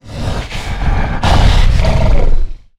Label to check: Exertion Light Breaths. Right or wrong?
Right